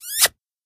kiss

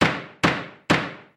gavel; Object Misc

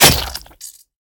sabre hit; Items hit

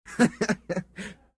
laugh, Voice Human Male

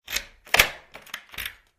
unlock, Keyhole

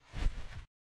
brutepack begin; Items Medical; OldThink

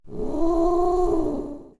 cat growl

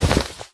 cloth drop; Items handling

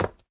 wood, Footsteps; Effects; OldThink

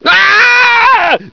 science scream, Human; Voice; OldThink

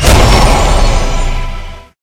hit, Mjolnir; Magic

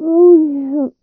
under moan f; Interactions

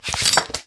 toolbelt pickup; Items handling

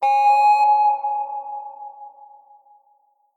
start draw

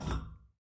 catwalk, Footsteps; Effects; OldThink

